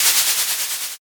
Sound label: eraser